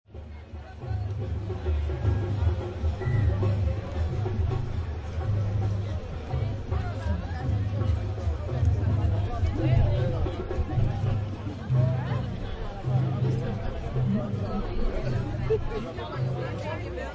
Street Festival, Background live ambience, street festival, sounds of drums, people talking; having fun (stereo) (human voice); Concert Sound Effects